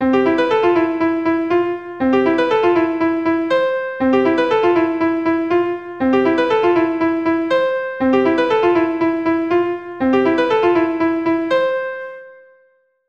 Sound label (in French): carillon